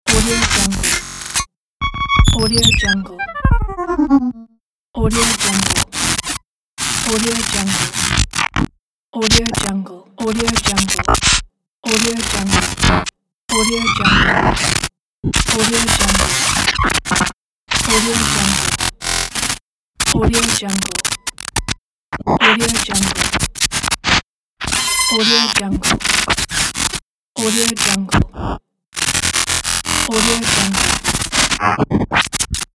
glitch

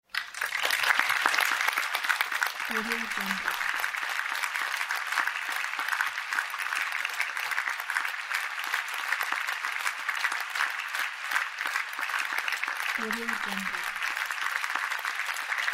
great applause

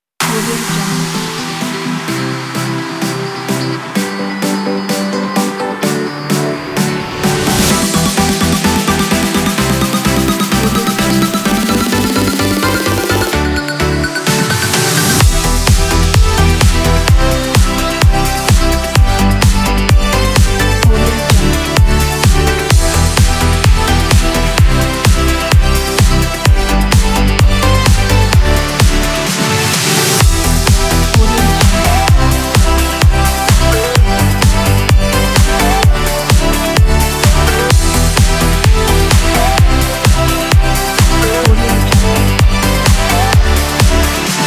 for dance

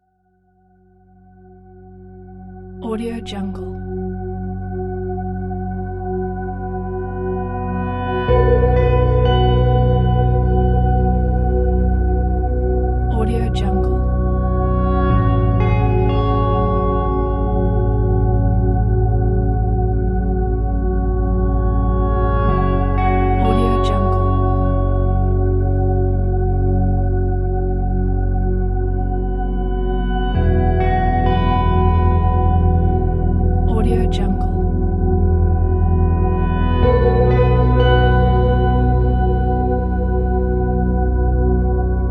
ambient space soundscape pack

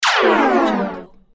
future hit

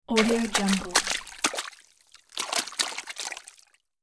light water splashes in pool; hollywoodedge

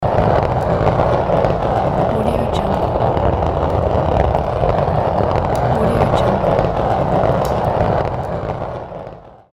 rolling

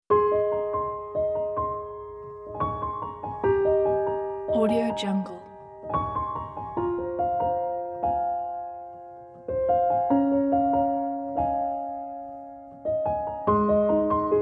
easy piano

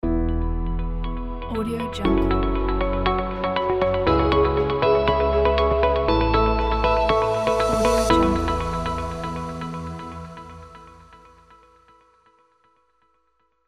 ambient logo

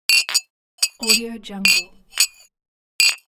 glasses clink

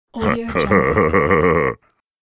ogre man fool laugh

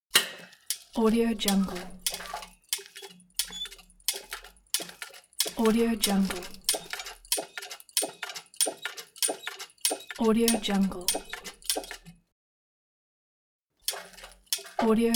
hand pallet truck lever

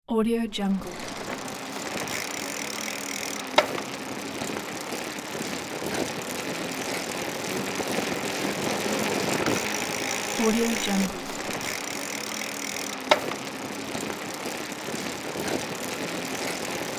bicycle

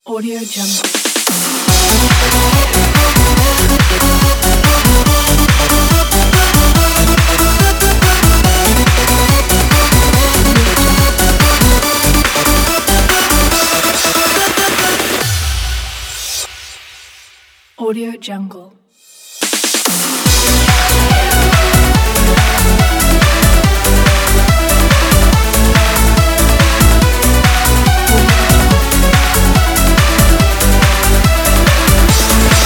progressive energy dance logo

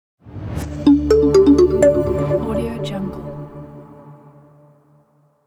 corporate short logo; tomasz-redman

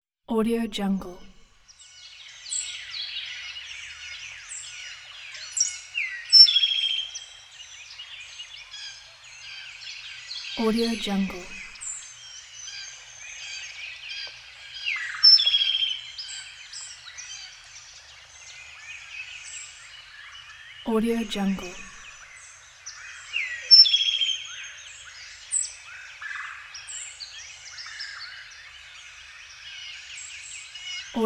forest morning; capeaudio

